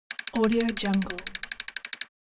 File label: slot machine spin loop